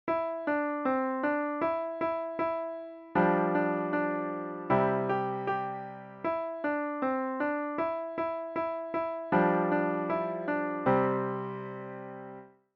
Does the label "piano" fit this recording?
Yes